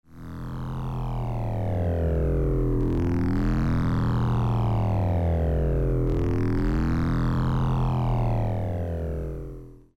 Shephard

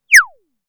Little Bird

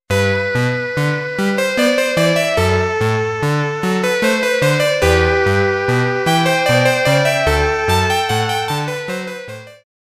Harpsichord

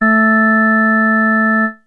Organ 220Hz

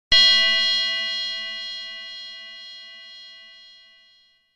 Grandfather's Clock

